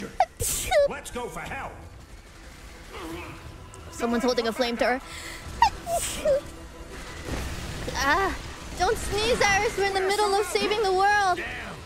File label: Sneeze